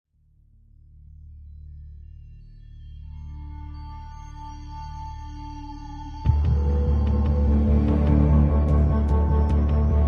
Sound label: Loop Sad; tales from the borderlands gamerip 2015